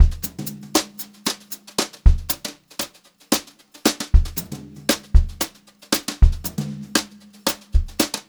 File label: Brushed Drum Loops, JAZZY